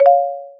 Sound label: Marimba